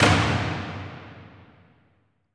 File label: Mahler Hammer; percussion; Cymatics - Infinity Beta Pack 2.0; Zeus Percussion Collection